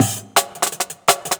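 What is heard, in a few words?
Jungle Breaks, 170 bpm; Original Jungle Breaks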